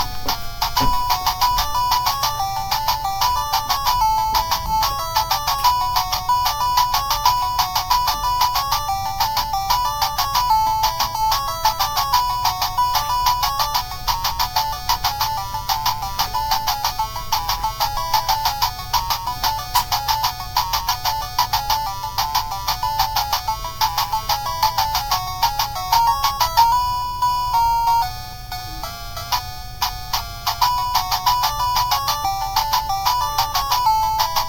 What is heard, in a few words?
Chinese Menu; Game Box Plus